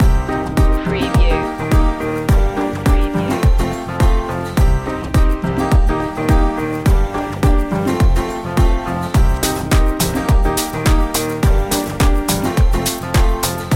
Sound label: island beats loop